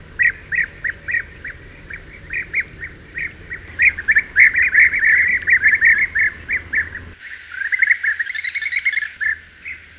call, bee-eater, At the end of the recording there is a long bubbling call, quite uncommon.; merops apiaster